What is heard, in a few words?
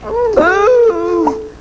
ouuuhhh